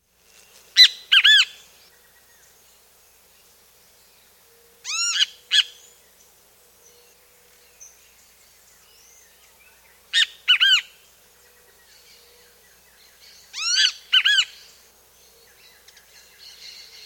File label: Great Kiskadee; S. America